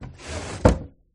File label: door open